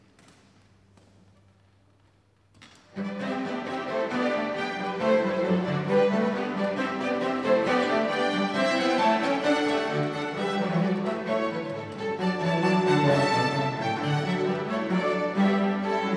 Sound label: Bach Bourree